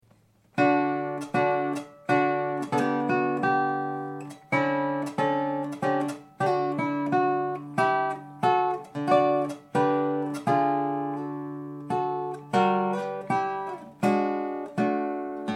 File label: Cantabile, Livingroom recording without sound effects; Sor; Op.31; DAT recording by Silvestre Borras